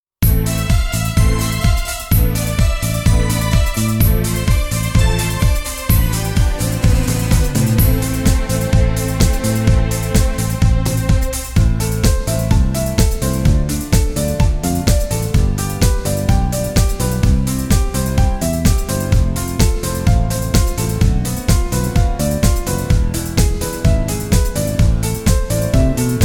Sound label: brass minus